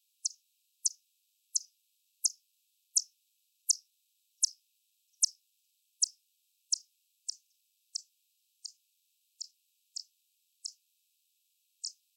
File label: Myotis emarginatus